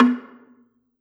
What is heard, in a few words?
Gambang Hard Beater, Gamelan